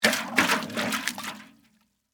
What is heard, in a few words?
Water Slosh Bucket, Water, Slosh, In, Metal, Bucket, Large; Splashes; Objects; BlastWave